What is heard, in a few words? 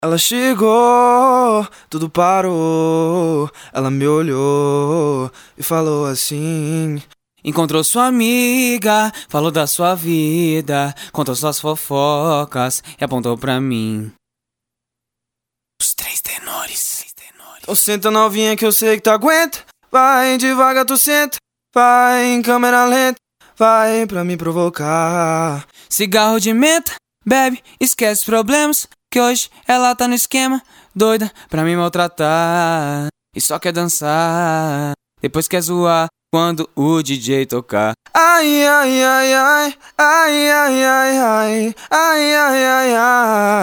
acapella